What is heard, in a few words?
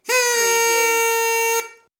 Party Horn